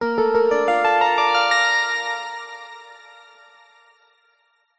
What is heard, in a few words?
musical ident signature soft calm warm sincere bright